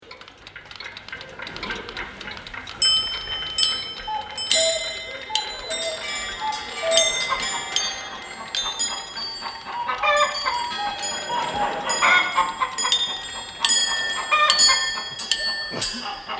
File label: Cuckoo; Hammering